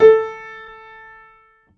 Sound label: Piano, Harmonic